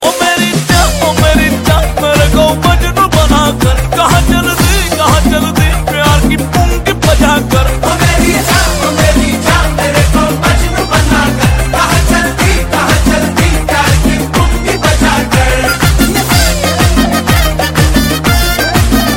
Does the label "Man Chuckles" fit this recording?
No